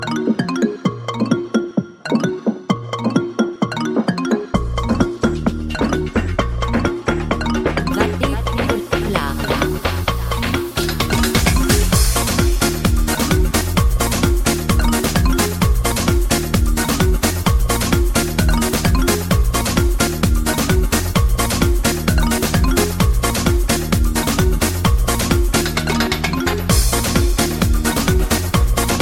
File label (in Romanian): Ritm